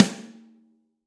Snare Stick Hit

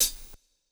Hihat Closed

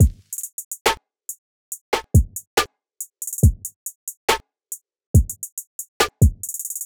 Trap Tastic 140 bpm, drums; Loops; Ableton Live 12 Lite; Core Library

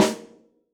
Snare Rim, drums

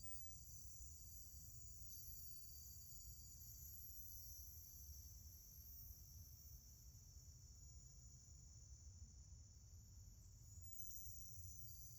Floating Symbol Ambience; Journey

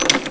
open; Game Maker 8

